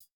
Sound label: hihat; Reference Beat BPM120; j city pop 1980